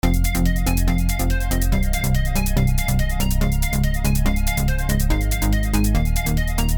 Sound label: Synth Layer Middle